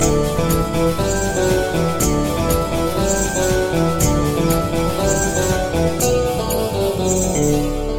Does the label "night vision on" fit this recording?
No